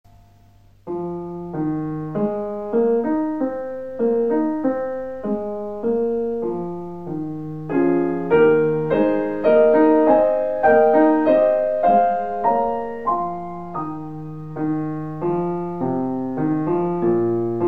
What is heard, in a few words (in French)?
Tableaux d une exposition Promenade 1 piano; Promenade 2; Modest MOUSSORGSKY